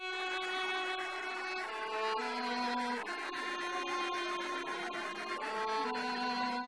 Castanets Violin Applause

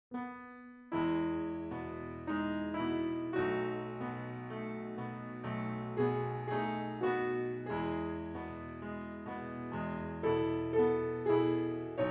Sync Wavetable Soni

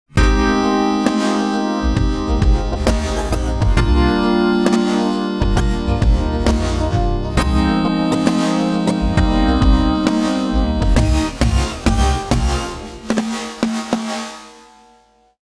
Pop

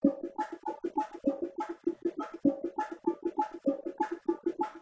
Bongo

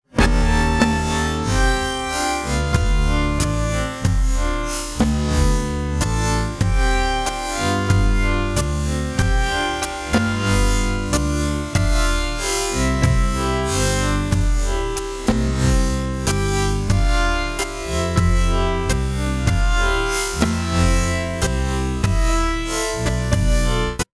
Jazz

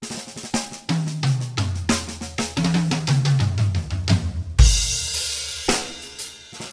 Drum Solo; TSMtoolbox

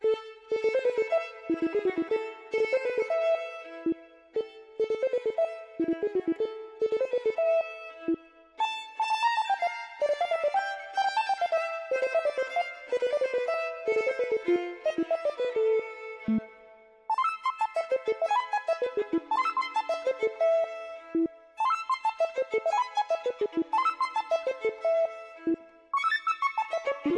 Sync Sine Soni

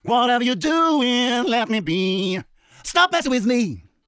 Singing Voice

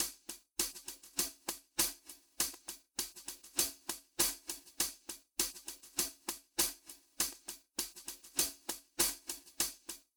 Wave Drum SEP HH; Decomp Mag Zero Phase TR

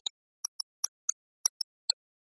Glockenspiel

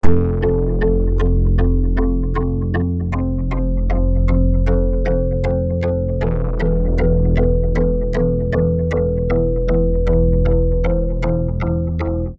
Synth Poly

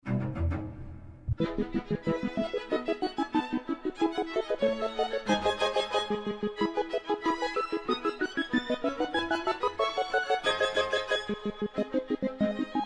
Sync Sine Soni

